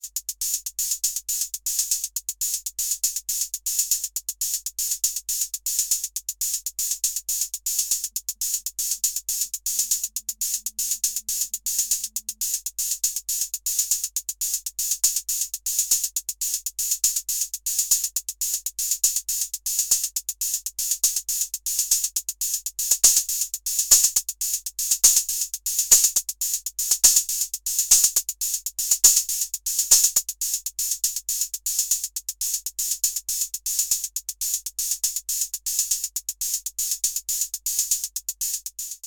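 Roland TR-06 Boutique TR-606 closed hi-hat open hi-hat int Dist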